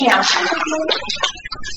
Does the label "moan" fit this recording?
Yes